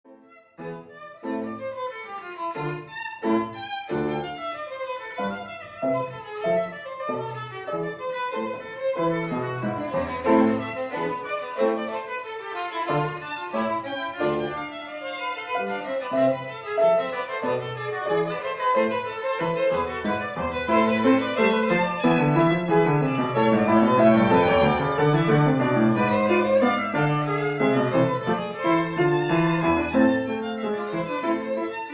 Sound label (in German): Bach Duett